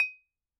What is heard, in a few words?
Bottle Struck